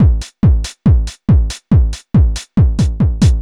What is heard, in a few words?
TECHNO BEATS 140